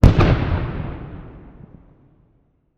Explosion Cannon Fire; Fire and Explosions

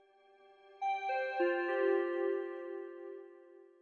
Login Failed; skype

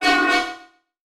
Multimedia Internet CD-Rom Flash Whoosh

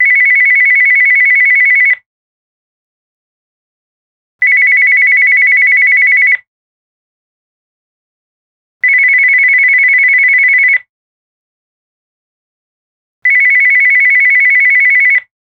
Technology Electronic Phone Ringing

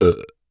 Human Male Burps

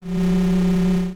Science Fiction Sci-Fi Electronic Energy Field

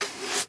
Household Broom Sweeping Cement Floor

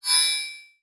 Multimedia Internet CD-Rom Flash High Pitch

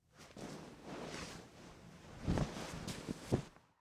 Cloth Blanket Adjust; household

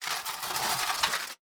Trash Can Lid Scrapes Dirt, Household

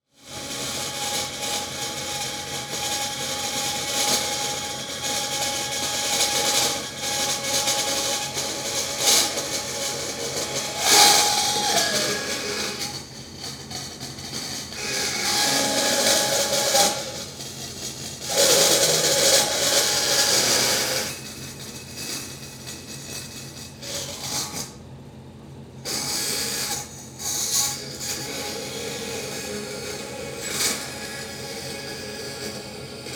Industry Tool Saw Band Saw Cutting

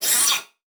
Multimedia Internet CD-Rom Flash Element

